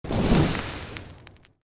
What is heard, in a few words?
Created Magic Spell Fireball Impact; Series of created spell sounds